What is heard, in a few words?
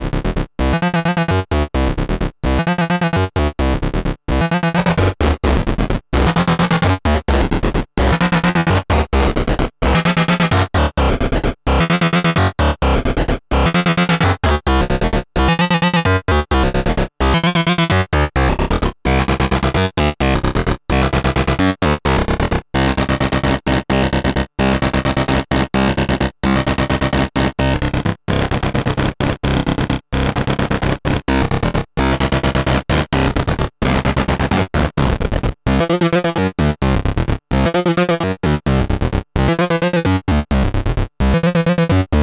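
Waverazor Dual Oscillator Slice Size and Duty; MOK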